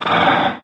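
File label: Movement Breath Out; smite; god bakasura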